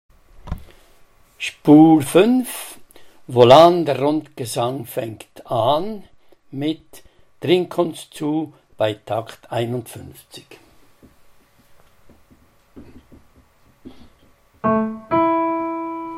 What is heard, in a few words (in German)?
Bass; Wien